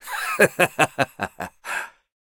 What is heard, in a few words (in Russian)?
Смех Фраза; Маккри